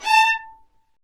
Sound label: viola marcato, String Sections; Violas; Roland LCDP13